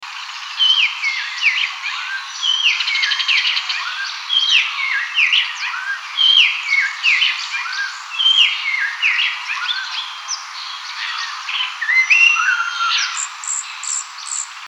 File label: red tailed palm thrush, Mbandaka